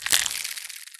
spider eggs; huevo+aranias

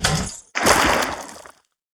mecha drop oil

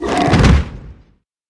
creep death

